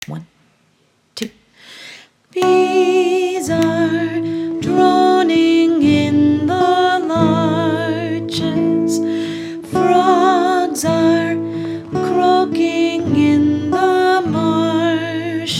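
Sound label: with vocal D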